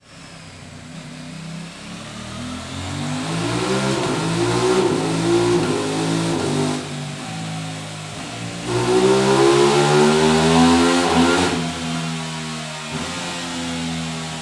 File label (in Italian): RS660 Guida Veloce